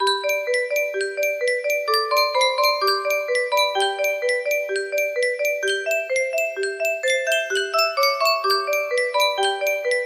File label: melody; mozart piano sonata no 16 in c major k 545 2nd mov